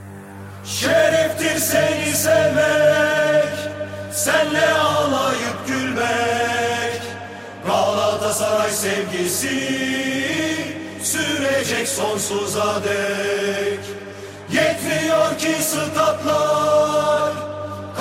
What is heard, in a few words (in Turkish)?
galatasaray telefon zil sesi